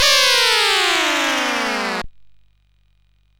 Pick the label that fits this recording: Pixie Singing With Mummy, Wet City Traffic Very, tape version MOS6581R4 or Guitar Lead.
tape version MOS6581R4